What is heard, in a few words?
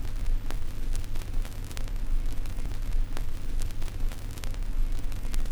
Crackle, Vinyl Noise